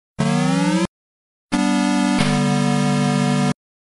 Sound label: Sega Master System - Startup